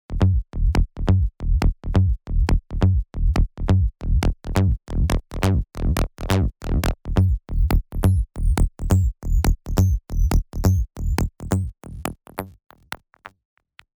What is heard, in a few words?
Bassline Daze, Spire; alonso 19 year anniversary pack